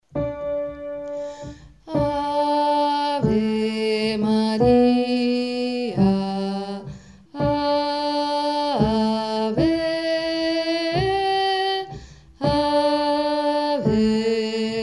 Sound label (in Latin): ave maria verbum panis bassi